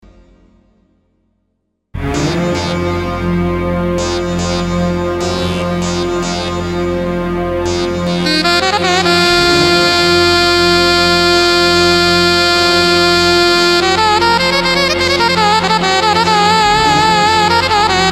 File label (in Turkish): roman, yeni ritim roman